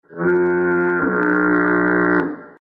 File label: foghorn; Voicemod